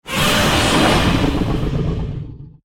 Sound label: Roar Long; Sfx Godzilla Roar Long 2; Godzilla Smash3